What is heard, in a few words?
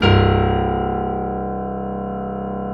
PNO FF; Synclavier Sampler Library